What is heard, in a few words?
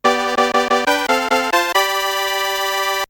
jingle ~ world clear